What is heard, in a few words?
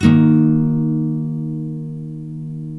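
NYLON, D# MINOR UP, CHORDS; Spectrasonics; Hans Zimmer Guitars VOL-1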